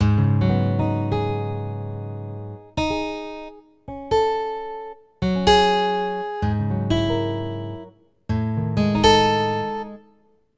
guitar; Kono Aozora ni Yakusoku wo; Melody of the Sun and Sea; PS2

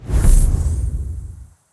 Animations Repair Large

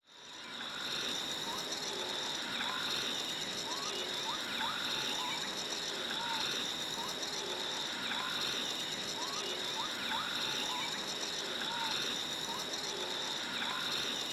Jungle; BanG Dream! Girls Band Party!

